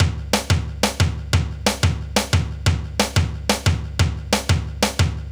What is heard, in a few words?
Kick Snare Loop